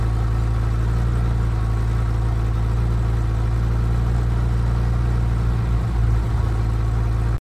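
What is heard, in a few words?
vehicle idle loop sports; The Sims 3; Windows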